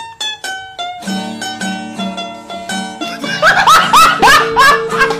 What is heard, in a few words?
Laughing meme